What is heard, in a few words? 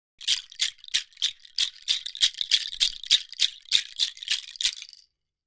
Shaker Bracelet Natu, percussion shaker